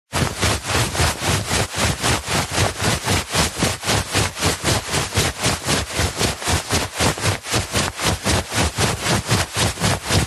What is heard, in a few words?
foley clothing movement shorts baggy running jogging